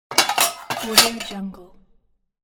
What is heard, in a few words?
clatter of iron things